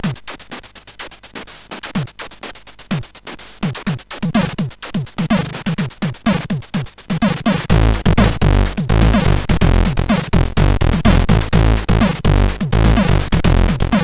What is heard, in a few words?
Funk, MOS6581R4